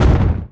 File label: mid step; Fate Undiscovered Realms